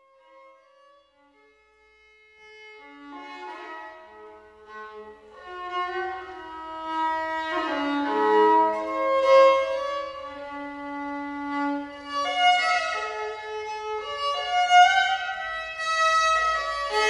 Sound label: Sweet Violin; iona